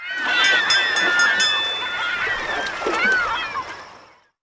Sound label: MARINA, buildings